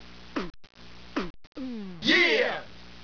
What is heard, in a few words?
rap